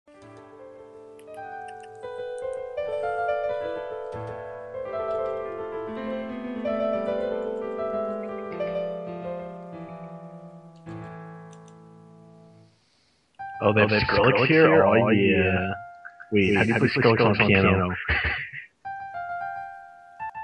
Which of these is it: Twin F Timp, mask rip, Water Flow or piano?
piano